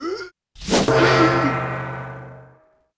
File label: HIT GONG